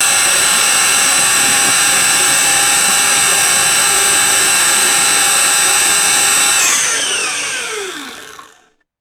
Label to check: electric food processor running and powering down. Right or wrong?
Right